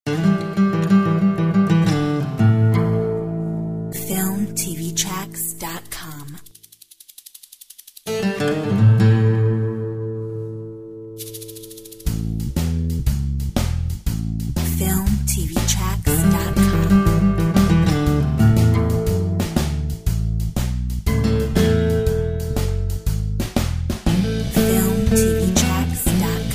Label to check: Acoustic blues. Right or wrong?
Right